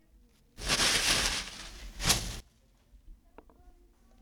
Crumped Paper

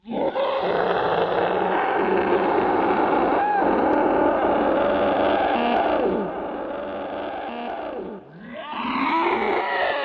Monsters Screaming Large Angry Beasts

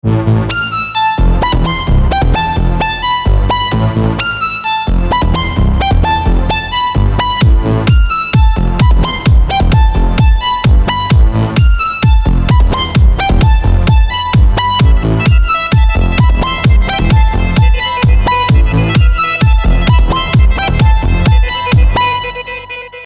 Club Techno Loop

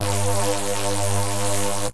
Elec

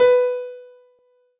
Piano Ff

